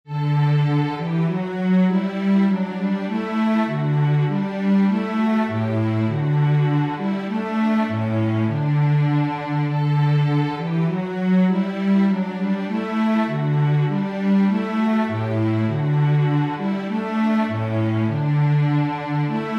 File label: bass; Deck the Hall